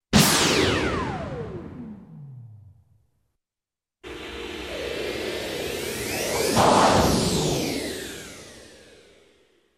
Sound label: POWERSHOT